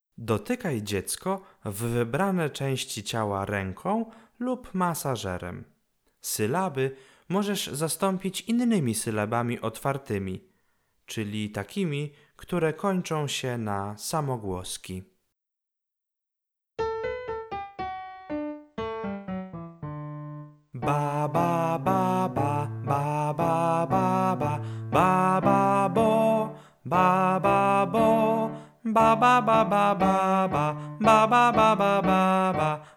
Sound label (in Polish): Sylabowo. Wersja meska. Pianino